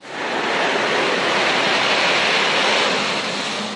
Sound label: shoe dragging backward on carpet; john-travoltage